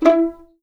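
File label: E4 pizzicato F, violins; East West; The Ultimate String Collection; VLNS PIZZ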